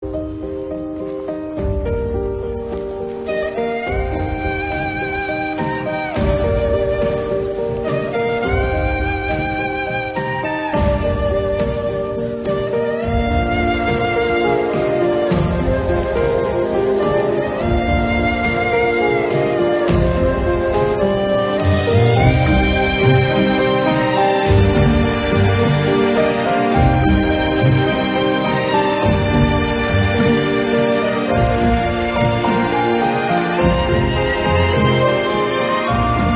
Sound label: Flute BGM Ringtone; Made In Heaven